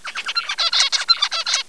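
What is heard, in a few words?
zebra finch; zfinch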